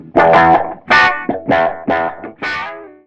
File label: 156 guitar; MELODIC LOOPS; SAMPLED MUSIC LOOPS